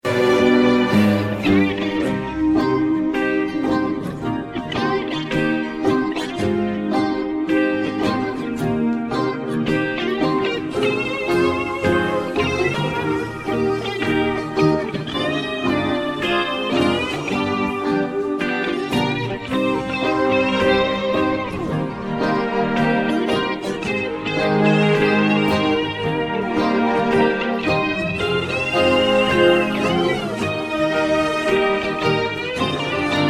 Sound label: modulated guitar solo